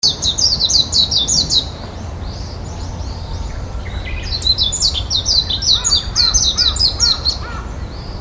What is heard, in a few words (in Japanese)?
mejiro